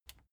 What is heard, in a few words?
plastic button latch loose push disable